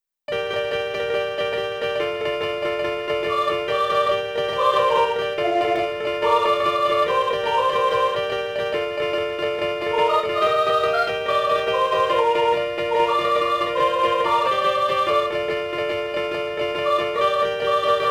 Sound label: band; BGM; infinitefusion